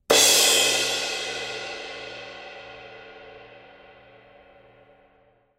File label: K Con Bounce Crash, cymbal